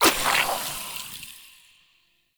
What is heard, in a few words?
Poison Shroom Spore Cast; Warframe; Nokko; Gyromitra